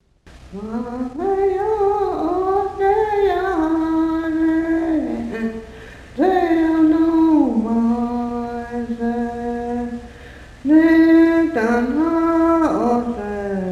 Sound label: hymnbook songs, cheyenne language; Ma'heo'o Nea'haanehe